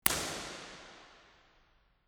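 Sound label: far, impulse response, St Georges Episcopal church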